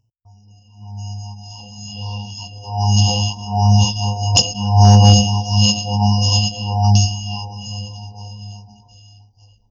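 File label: a mystic pulsing portal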